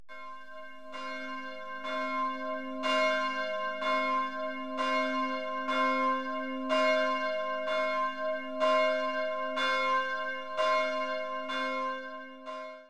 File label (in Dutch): Zo zal de klok gaan klinken